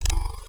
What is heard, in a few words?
Hack UI Time Tick; Warframe; The New War; Narmer Hack